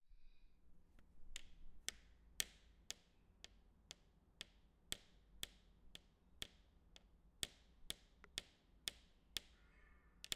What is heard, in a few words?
Pen Tapping On Table